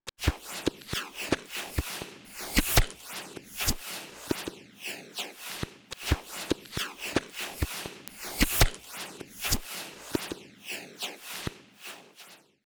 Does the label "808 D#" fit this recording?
No